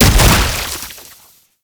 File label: Proto Infested Brachiolyst Projectile Explosion; Warframe; Abilities